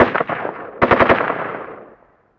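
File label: gun ak47 distant; Call of Duty Modern Warfare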